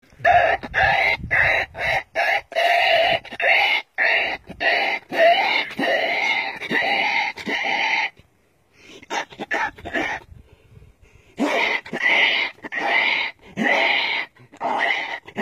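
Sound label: peccary sounds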